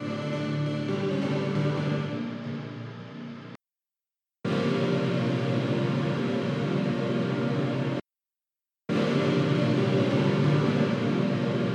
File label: Synth